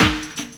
SD, HIP, Dance Mega Drums

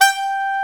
SAX G4, SYNTHESIZER LEAD

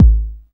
909 BD, TR-909; Best Service Dance Mega House Dance

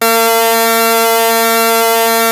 ARP 2600 F4, synthesizer; Hollywood Edge - Giorgio Moroder Rare Synthesizer Collection

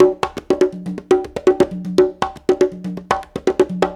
Latin Congas, African Contemporary

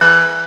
DR. LOOP; X-Static Goldmine 3; CHORDS

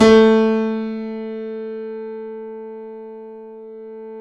KEY, YC7 Piano ff

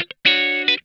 TIGHT, Funk Guitar; Zero G - Funk Guitar

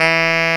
SAX TENOR, Roland Super Sax; Roland LCDP07; Tenor V-sw; Tenor 4way

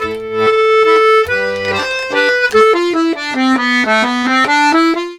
Accordions; USB Soundscan vol.40; AKAI

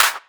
Clap; Equipped Music; Loungin House

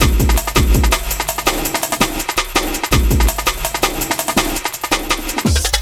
165, Drum Loops, Drum & Bass; Total Drum&Bass

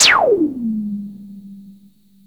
ZAP, ZAPS THUMPS; Best Service Alpha Dance vol.2

